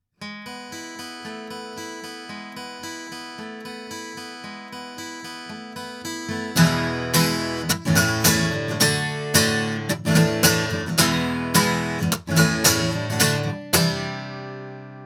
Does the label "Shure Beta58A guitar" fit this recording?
Yes